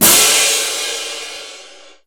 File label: Crash, Cymbals; Crashes & Cymbals